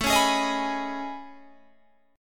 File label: A Major Sharp 11th, Guitar, Open G